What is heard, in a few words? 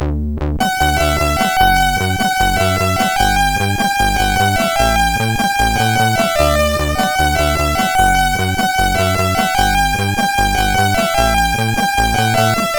Rock, MOS6581R4